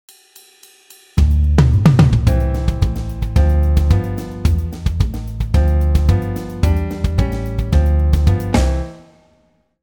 Jazz backing, Guitar

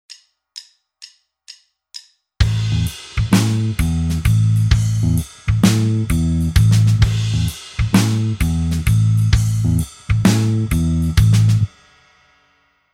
backing; Guitar Techniques 2018 Issue 280 April

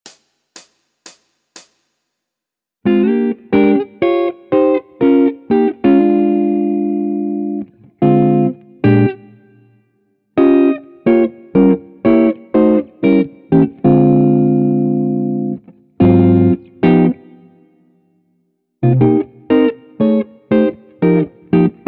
Jazz Chord Melody, Guitar; Guitar Techniques 2019 Issue 297 July

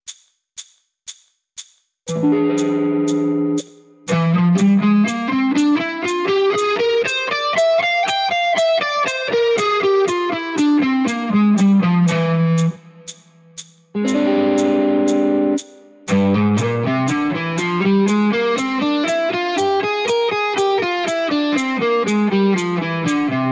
Fretboard Navigations, Guitar Techniques; Guitar Techniques 2019 Issue 297 July

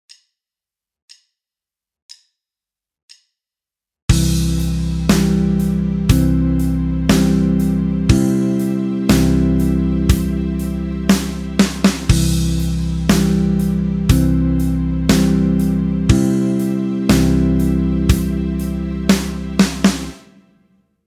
backing; In The Woodshed; Guitar Techniques 2017 Issue 274 October

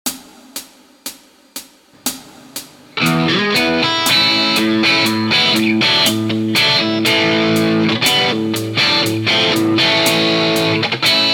Lickbag, Guitar; Guitar Techniques 2019 Issue 297 July

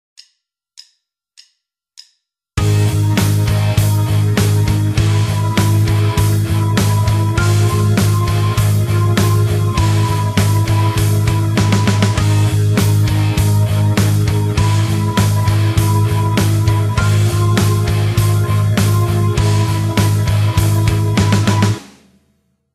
Rockschool backing; Guitar Techniques 2018 Issue 278 February

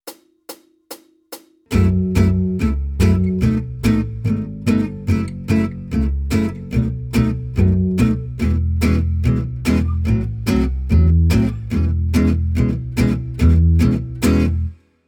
Jazz, Guitar; Guitar Techniques 2019 Issue 290 January

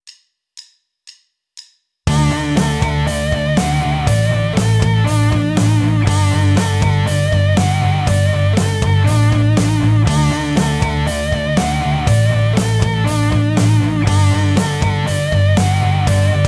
Tapped Harmonics, guitar

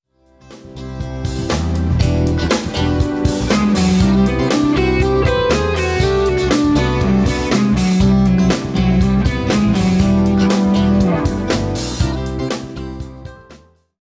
Fretboard Navigations, Guitar

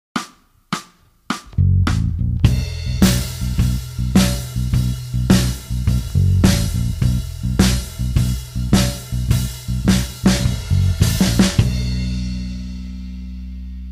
Slide backing; Guitar Techniques 2018 Issue 278 February

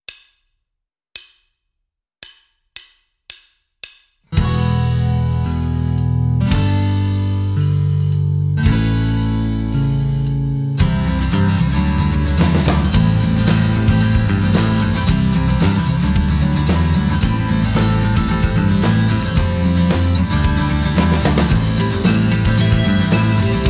Rhythm Guitar; Guitar Techniques 2018 Issue 289 December

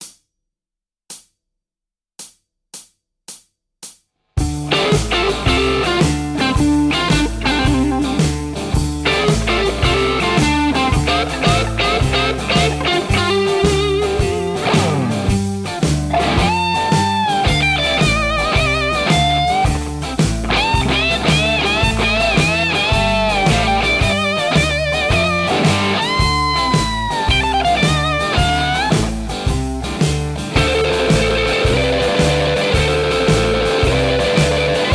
Blues, Guitar Techniques; Guitar Techniques 2019 Issue 290 January

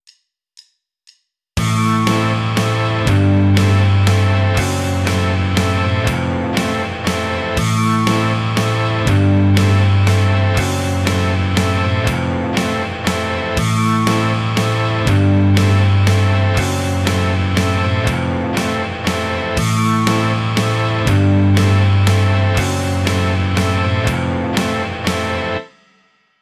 Rockschool, Guitar; Guitar Techniques 2018 Issue 289 December

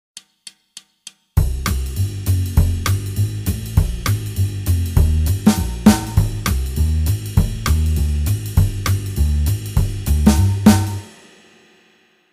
Jazz backing, Guitar; Guitar Techniques 2018 Issue 278 February

